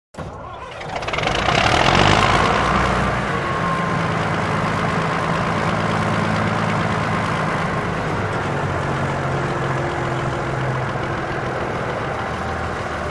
Bog Cutter; Bog Cutter Start Up, Idles And Switch Off.